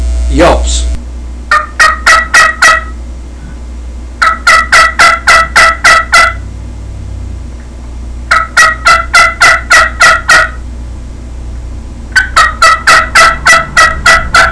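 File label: hen lite yelps, turkey call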